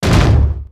Ark Close; foley

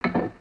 Chest Idle

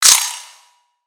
Spider Die; Lego; creatures mechanical

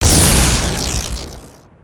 Plas Blas; foley

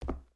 Foot Wood Boot Walk, footsteps

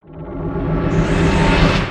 howl; creatures organic

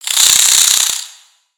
Spider Rage; creatures mechanical; Lego

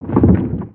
Lava Rumbles

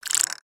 Broombot Sm Whirl, creatures mechanical; Inventor Broombot

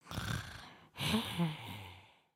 Sleep, creatures organic; Lego; Strombie

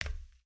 Foot Steel Boot Walk, footsteps

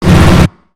Robot Air Flail; Lego; creatures mechanical

